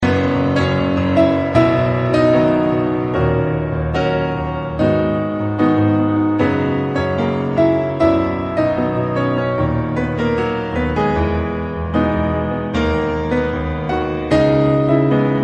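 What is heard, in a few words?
Acoustic Piano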